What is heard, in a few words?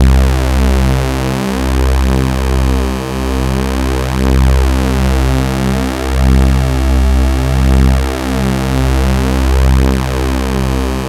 OH BEE, KURZWEIL; BASIC-KW2000